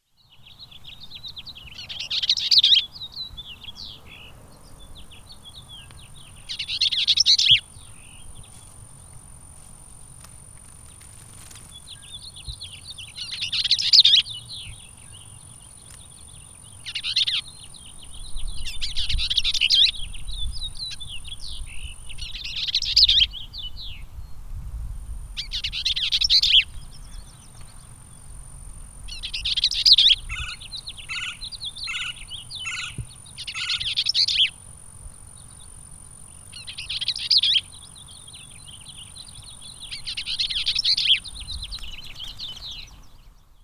Bells Vireo

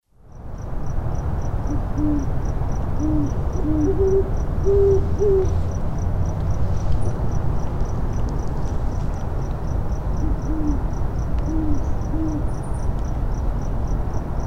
Great Horned Owl

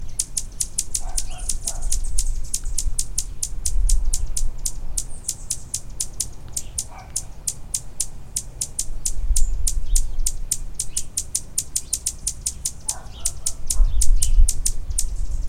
Hummingbird; Annas

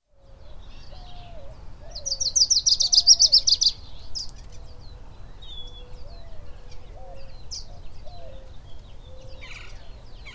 Lucys Warbler

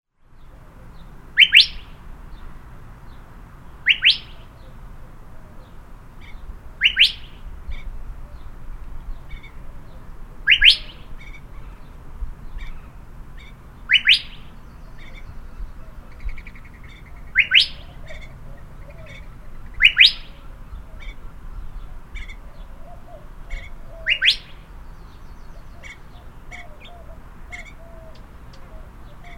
Curve billed Thrasher

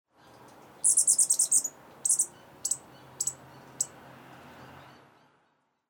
Costas Hummingbird

